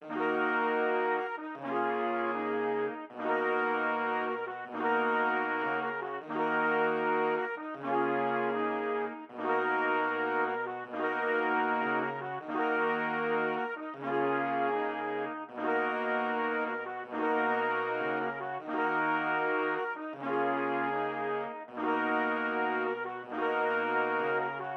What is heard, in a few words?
Classic Melody Loop 155 BPM D# Min Brass; Loop Stems; Cymatics; 2020 Melody Collection